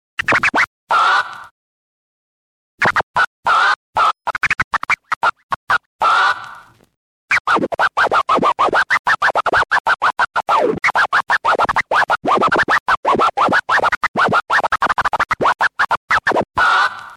scratch

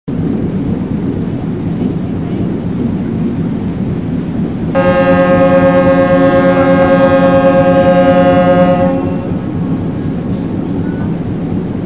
Lake Champlain Ferry Boat Horn

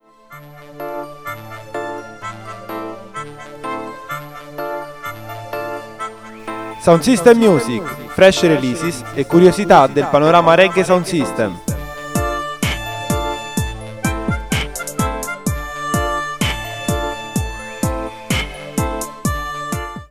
Sound System Music

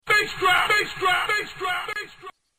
basedrum

